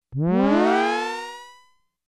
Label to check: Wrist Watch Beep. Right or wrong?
Wrong